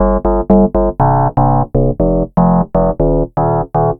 Burzar Bass, Bass Foundations; Sonic Foundry (Sony Creative Software); The Electronic Music Manuscript Collection

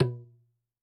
Tabla B, Yamaha DTXpress-II, Perc

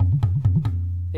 GAE GAE, TABLAS, PERCUSSION; AKAI S6000 CD-ROM - Volume 1

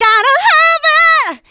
Female Vocals; Houseworx

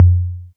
Tom Lo, Novation Drumstation; DrumstationV1

